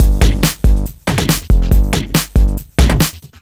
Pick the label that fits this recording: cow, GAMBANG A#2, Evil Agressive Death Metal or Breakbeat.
Breakbeat